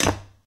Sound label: glass land; Golden Crayon Sounds